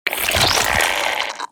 Cardinal Death

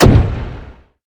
Cannoneer Attack

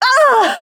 Witch Death

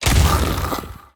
Kobra Attack

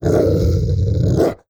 Howler What

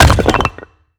Cursed Casket Attack

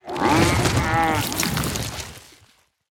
Sludge What

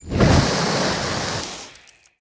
Pyro Attack

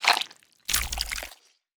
Eggsack Death

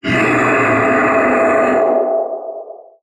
Ocean Templar Death

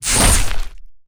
Deathcap Attack

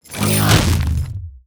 Golem Attack

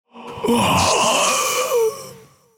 Rogue Wave Death

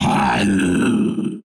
Gateguard Death

